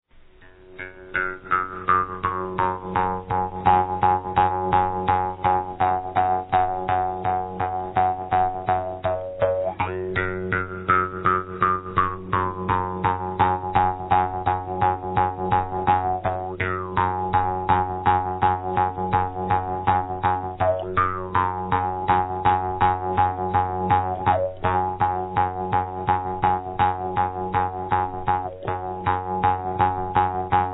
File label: Jaw's Harp